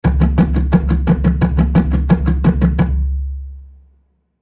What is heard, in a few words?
dry drum, maryhill sourcecentre miccentre